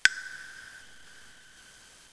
Tock

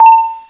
FM Beep

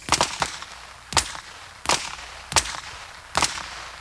Pistol

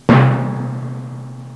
Drum

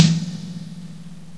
Drum

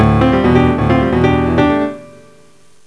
Piano

